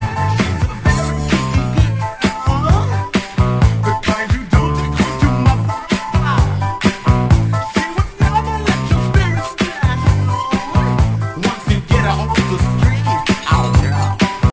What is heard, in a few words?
music